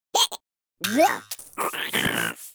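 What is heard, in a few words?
crab get free spins; netent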